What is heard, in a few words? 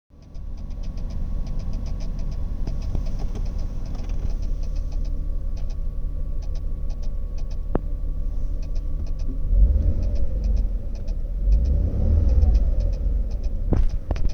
ticking sound inside cabin; x-type x400